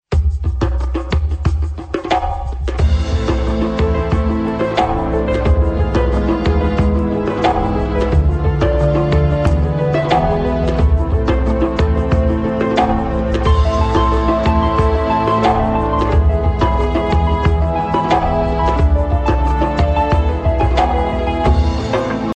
islamic intro ringtone

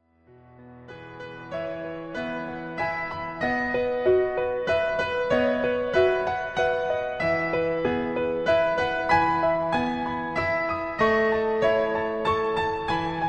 birthday piano ringtone

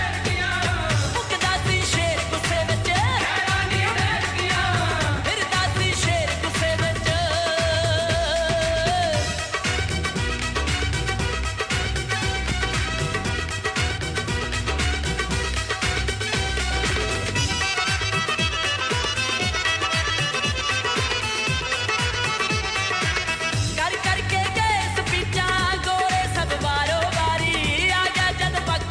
latest jazzy music ringtone; bollywood ringtones

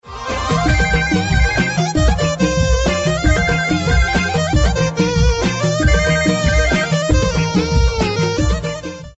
ring with music ringtone; galaxy ringtones

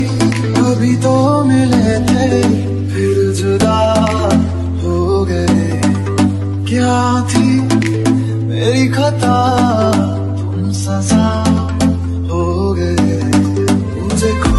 romantic ringtone, bollywood ringtones

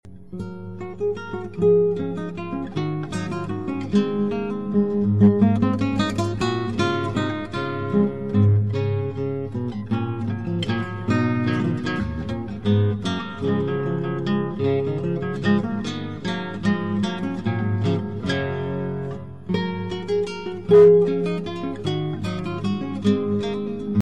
classical minuet in G; bach

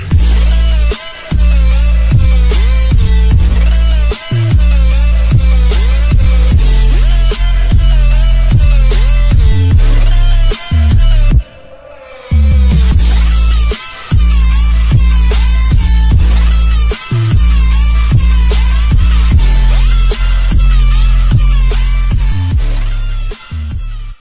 new english amazing ringtone; english ringtones

